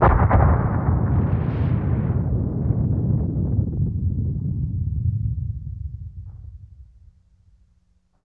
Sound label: Volcano Eruption